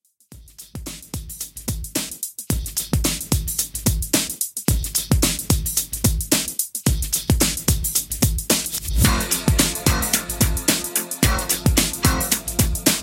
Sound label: 80s Redrum 110 Bpm Clean